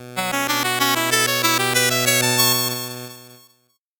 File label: 8bit jingle fast